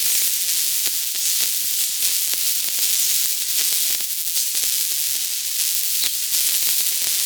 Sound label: Small Spark Shower Loop; Ambience; Props; Warframe; TheNewWar; Zariman